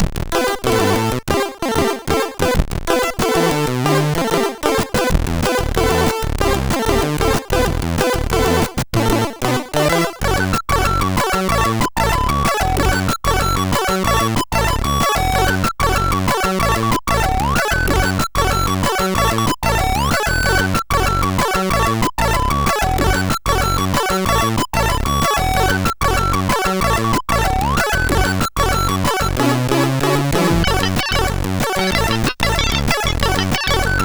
sid CSG8580R5

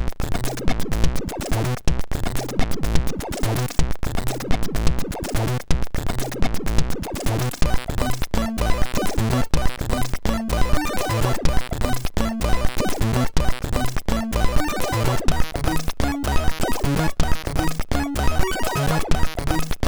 sid MOS6581R3

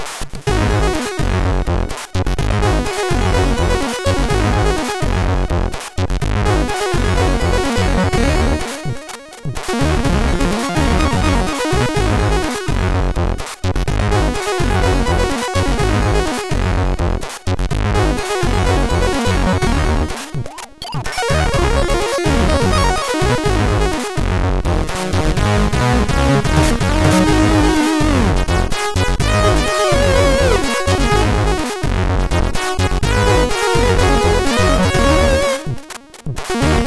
sid MOS6581R3; Owen Crowley; Dump Draxco 1x speed version

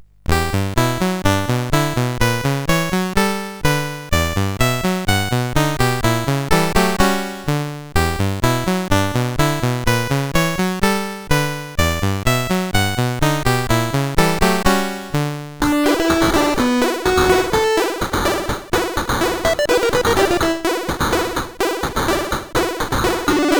Smelly Bum Noise, CSG8580R5; DEMOS

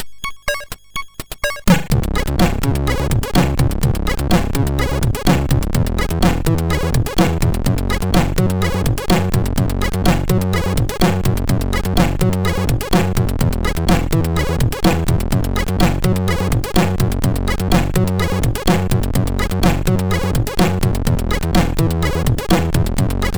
sid MOS6581R2

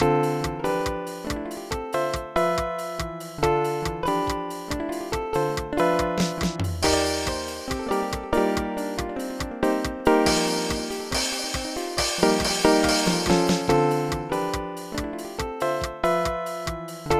solo; Dr. T's MIDI Recording Studio

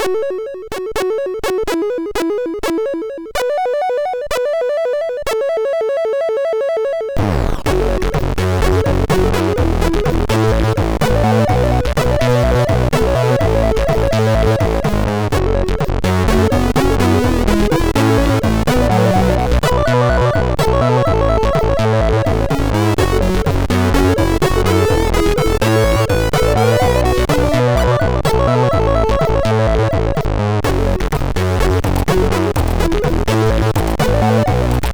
sid MOS6581R3; Template

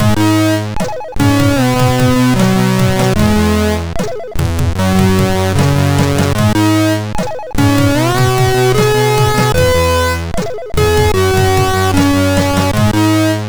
intro, sid MOS6581R2

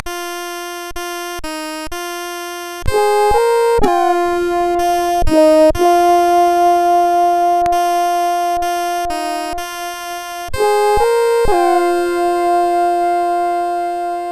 Unknown Melody; Nordischsound; CSG8580R5

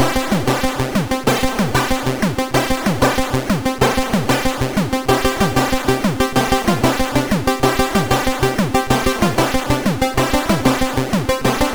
Small Song, sid MOS6581R3

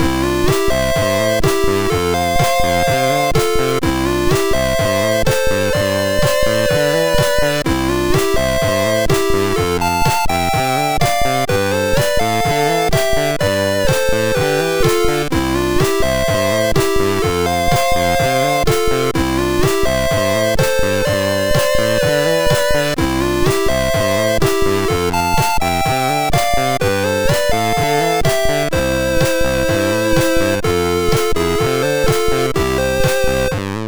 Action Biker Tune Study, sid MOS6581R3

